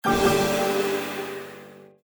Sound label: Combo Boom; Kung Pow